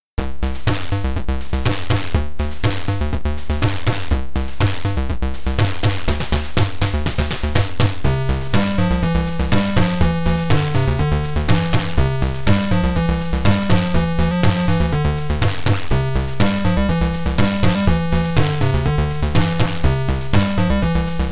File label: Salsa